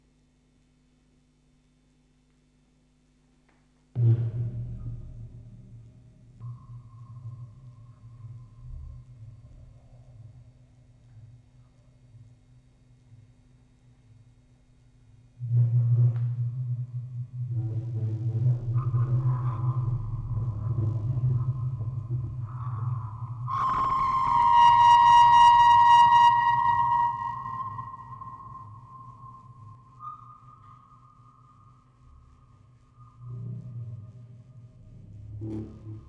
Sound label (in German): Aufnahme Rode NT4 Tape out Vocoder; Spieluhren-Quartett mit Tonband, Vocoder und Fieldrecording